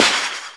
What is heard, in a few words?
SMASH, INDUSTRIAL